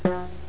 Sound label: PLAIN BEEP; JIGGLE95